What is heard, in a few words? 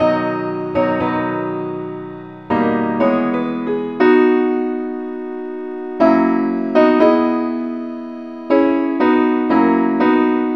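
simple accompaniment keyboard; pilot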